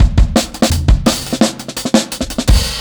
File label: Righteous 2-Bar Fill, Drum n Bass; KJ Sawka - Drum n Bass & Beyond; Beats and Percussion